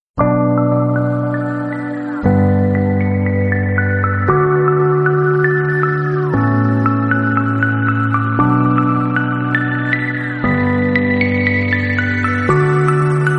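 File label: car remix background music; tesla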